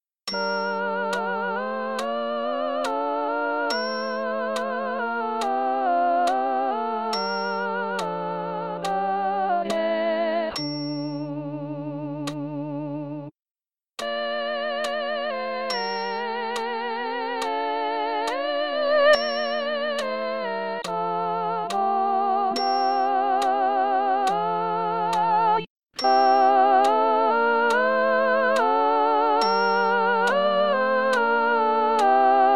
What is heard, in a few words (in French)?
Voix Soprane; Barechu